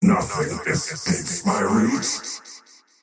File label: attack pain; dota; faceless void